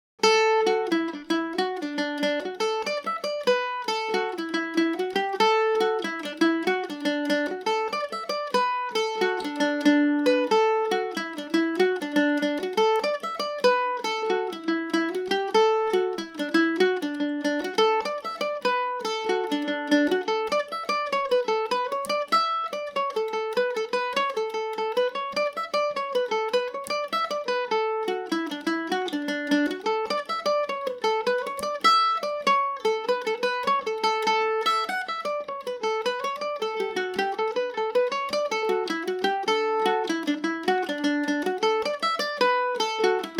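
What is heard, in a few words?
D major g and o, Irish mandolin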